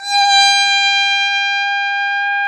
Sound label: BRS TP, Roland, TRUMPETS